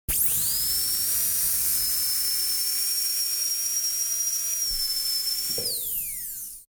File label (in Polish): TURBINA